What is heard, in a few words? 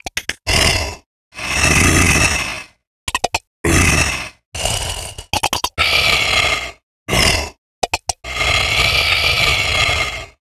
spider idle